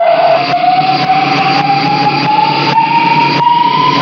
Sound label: Ancient Noise FX, Industrial